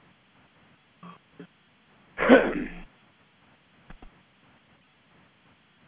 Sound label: cough